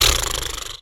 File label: human air blow through closed lips rasp female